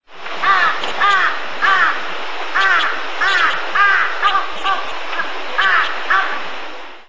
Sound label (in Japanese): karasu; 着信音やアラーム（目覚まし）音に使える、着モーションとして; FOMA